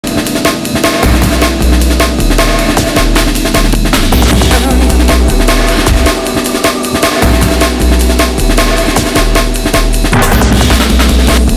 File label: techno